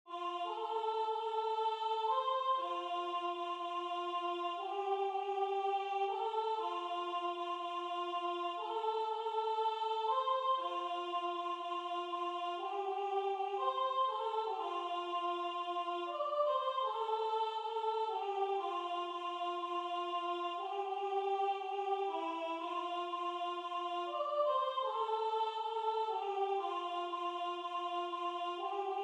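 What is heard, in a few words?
Soprano, Religious Folk Songs Of the Negro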